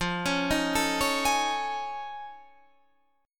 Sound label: F 7th Sharp 5th Sharp 9th F; F7#5#9 arpeggiated